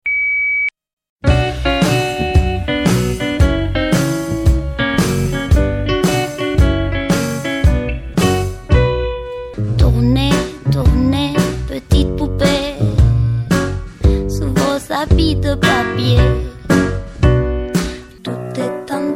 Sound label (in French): musique engin impose ruban